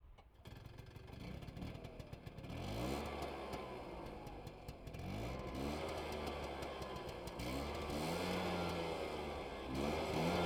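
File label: Ape P703V Exterior Idle Various Wide, Piaggio Ape; 1993; MKH8060; Pole Position; tm P703V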